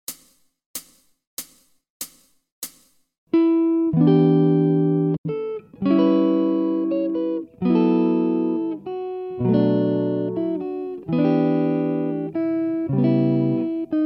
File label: Greensleeves, guitar